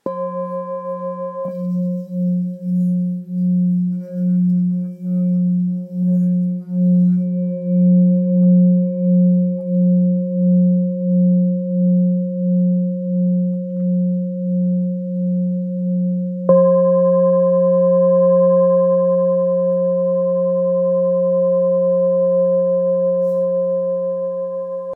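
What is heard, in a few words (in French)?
Full Moon fa, bols chantants tibétains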